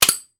Metal Shell Ejects; Small Hollow Metal Impacts. - Kitchen